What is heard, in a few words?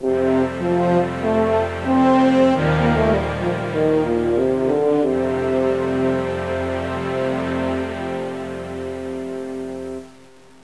LOSEMUS